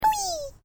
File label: Created Creature Small Call, Alien Sound Effects